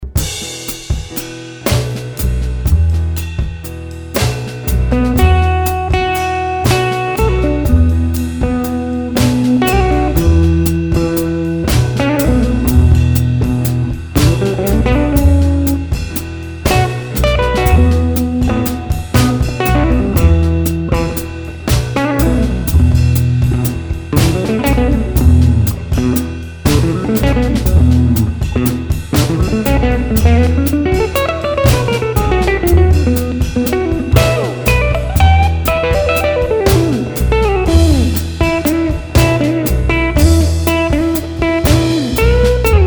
improvising mix

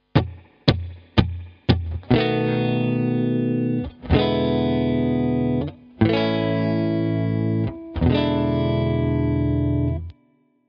blues, guitar

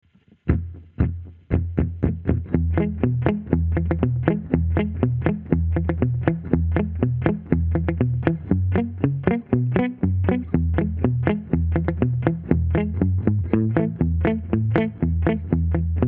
blues Fast 240bpm

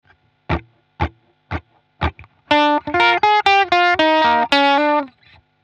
blues, guitar

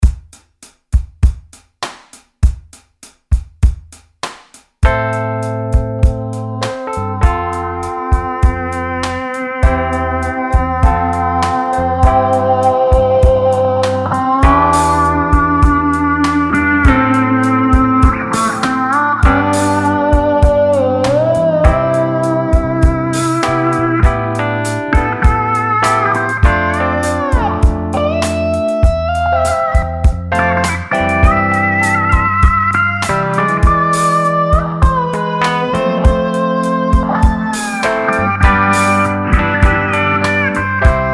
slide, mix

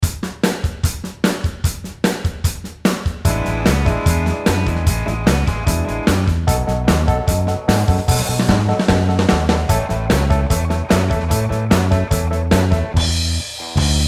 slide backing

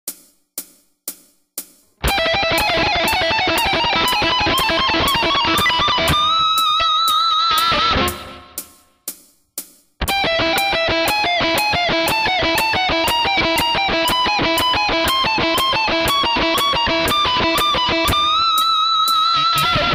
shred, guitar

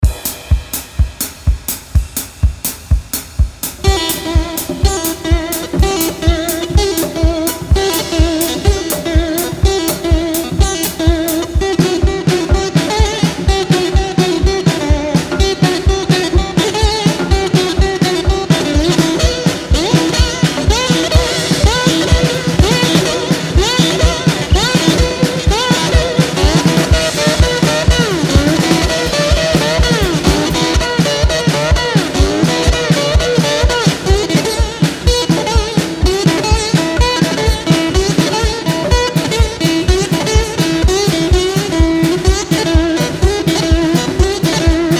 slide, mix

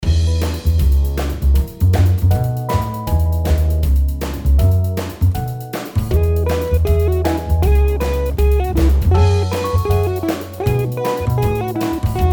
improvising 158bpm

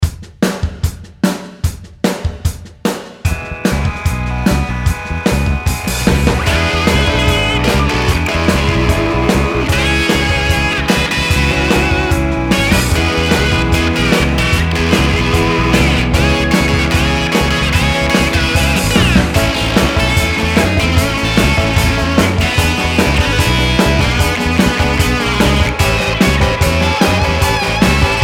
slide

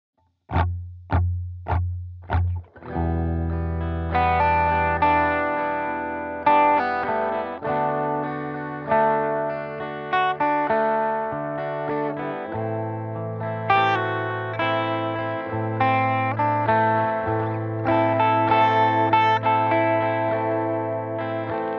blues track

